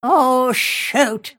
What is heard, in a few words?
deny pain; Snapfire